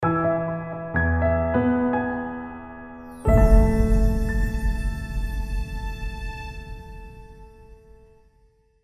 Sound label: Romantic Theme - Loop Ending